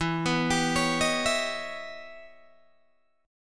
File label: E Minor Major 13th E Guitar DADGAD; EmM13; arpeggiated